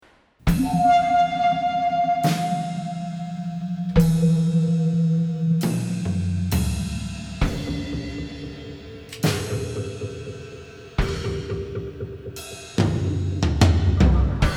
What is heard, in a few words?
duet